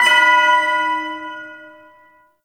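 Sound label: Ersatz Shawzin Octave Two B; Music; Warframe; Duviri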